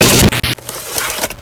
spit; midnight guns; vhs

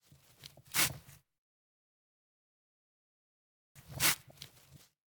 bandage world, player; midnight guns

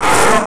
pain, player; midnight guns

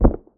foot carpet, footsteps; midnight guns

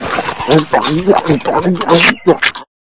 eat; food; midnight guns

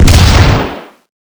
cannon fire stereo; midnight guns

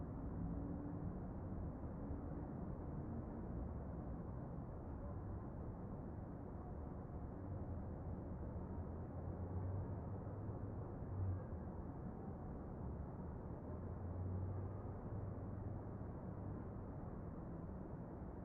amb night city; midnight guns

